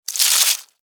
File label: Insect Move Wet, Insect, Movement, Wet, Bug; Blastwave; Animals:Insects